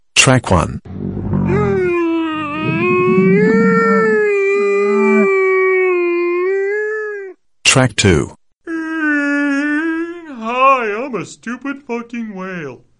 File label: camel or whale